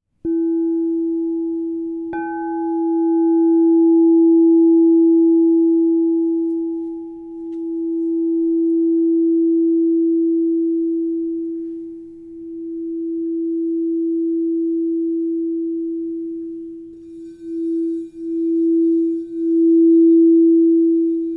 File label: Crystal Tones Singing Bowls